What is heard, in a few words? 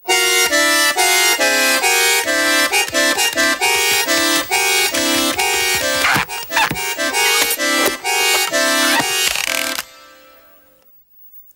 Noisy Accordian; pingu the original soundtrack